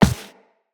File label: Walk Default, Footsteps; Operator